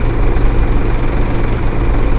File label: generator loop